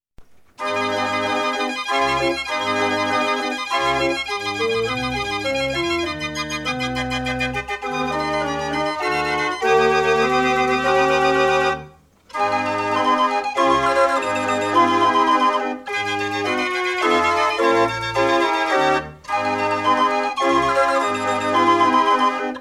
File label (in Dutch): draaiorgel; de sik